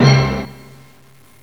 Orchestra Hit; GMTones; Ensemble; Amiga 8-bit Sampled Voice

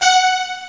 rnr keyboard; Epic Collection 3, The; Epic Marketing; muzak

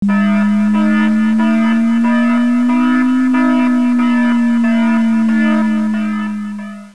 mutant; XMEN2

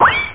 zing

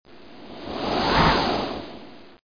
Swish

